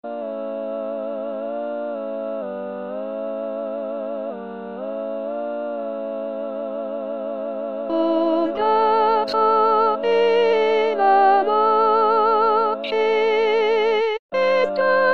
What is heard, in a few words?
Nocturno Soprano